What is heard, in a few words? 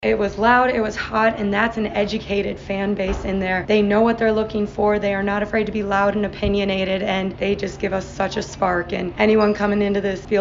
volleyball crowd; Waldorf